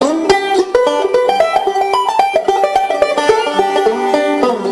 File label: banjo; gcompris-qt